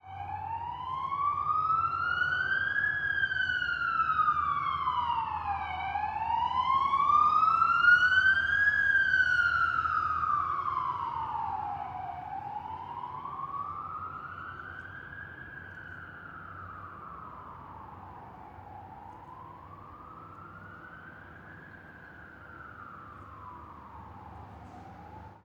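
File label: Vehicle Siren Ambulance Siren; MKH; SanojSounds; Essentials Vol.1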